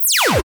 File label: Blaster Fire, cartoon Foley Fx